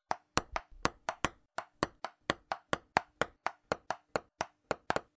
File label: Ping pong